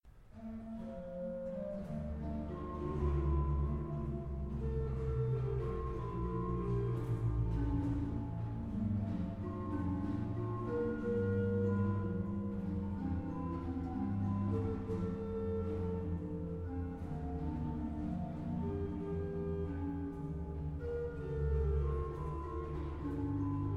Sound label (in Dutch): Orgelcompositie Psalm